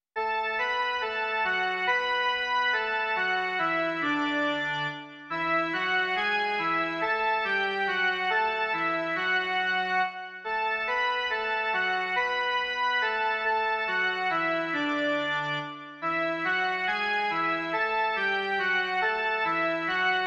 Blahoslavenství v době vánoční - jen melodie; Mužská verze; Doprovody Farského liturgie

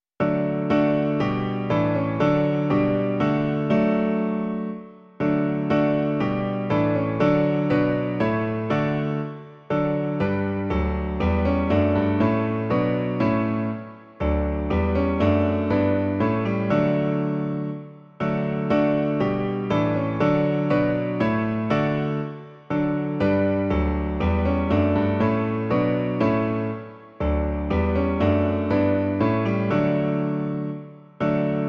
klavír

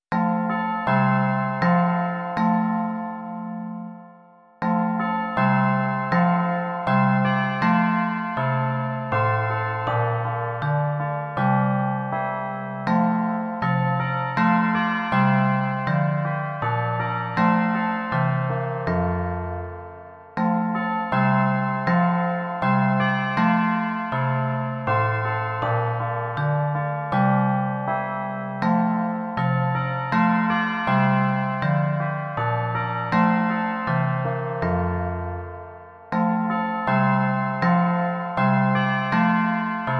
zvony a vibrafon; Imaginativní verze

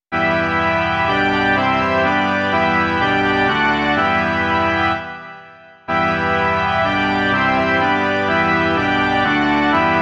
varhany; Ó veď nás, Pane, v slovu svém

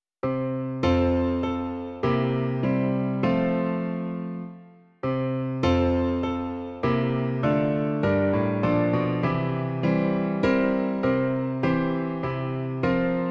My milujeme Ježíše - klavír; Klavírní verze

